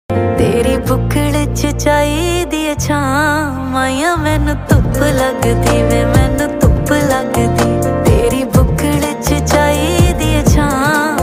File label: punjabi song